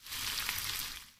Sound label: cook; mod cooking